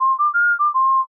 matsuri fue

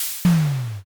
hydraulic

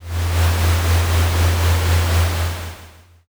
conveyor

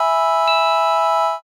church

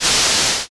voice shh

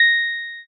pon

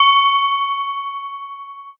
handbell

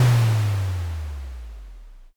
cannon

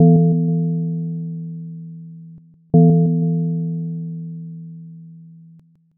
gong clock

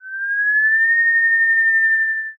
kettle

